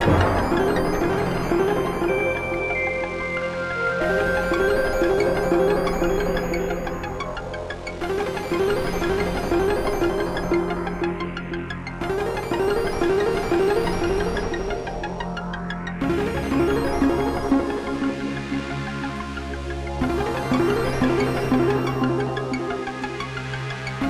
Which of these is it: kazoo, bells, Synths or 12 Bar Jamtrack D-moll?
Synths